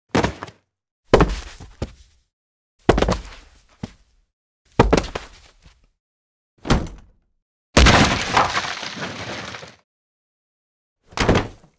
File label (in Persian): انواع صدای افتادن بدن